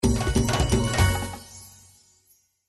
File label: win bonus; Monkey Spin